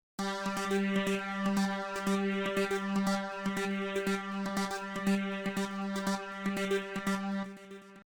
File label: stab